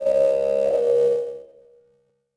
klaxon; Star Wars Obi-Wan; XBOX

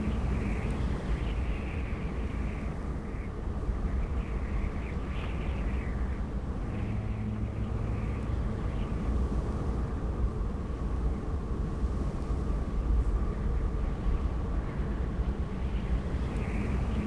wind, ambience; Star Wars Obi Wan; XBOX; coruscant

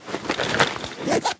backpack; Tony Hawk's Project 8; XBOX; bedroom

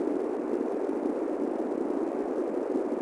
ambient; bracken

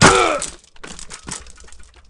fail; Matt Hoffman's Pro BMX 2; XBOX

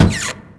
Door Swing Small Open; Max Payne

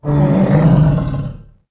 growl, Dino

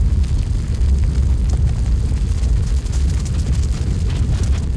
globe roll, impacts explos

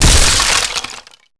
break wood box; breakable

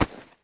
bodyfall grass, foley

